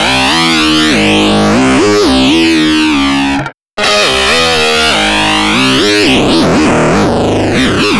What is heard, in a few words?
Guitar Based; Guitars; Eclectic Electronica